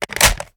ar reload drum fast mag in, weapons; viper

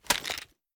drop down; reloads; gmod; iw8

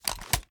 reload empty xxmag start; gmod; iw8; uzulu

